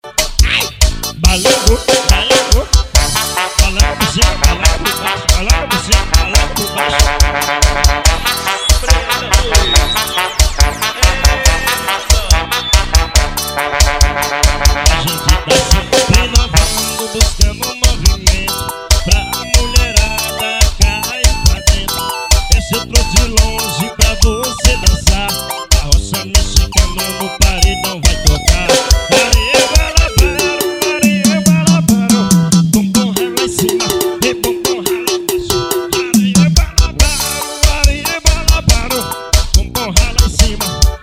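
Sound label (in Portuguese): arrocha mexicano ao vivo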